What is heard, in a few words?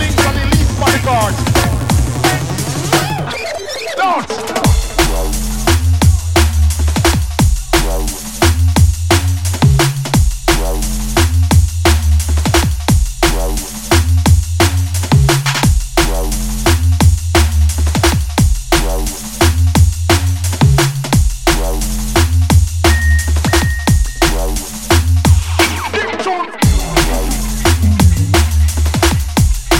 bass duppy